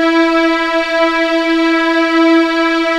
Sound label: E4 LEG F, VIOLINS; East West; The Ultimate String Collection